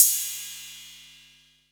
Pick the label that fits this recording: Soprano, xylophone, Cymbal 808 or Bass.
Cymbal 808